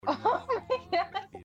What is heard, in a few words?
hahahaha